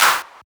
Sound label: clap snap; Snares